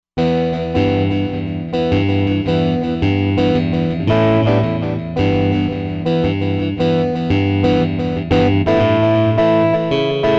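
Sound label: Guitar Lo Distortion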